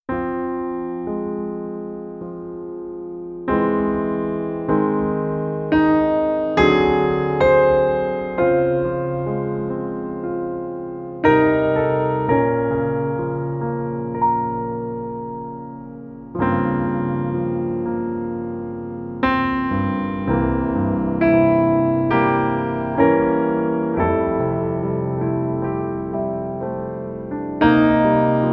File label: Ruby Grand; CFX